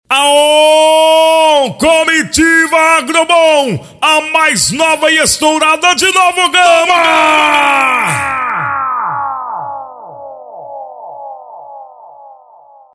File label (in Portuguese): Vinheta